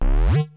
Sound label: pickup; Android